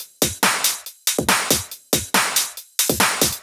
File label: Loops 140 BPM Hihat Percussion Loops